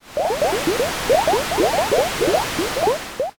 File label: boiling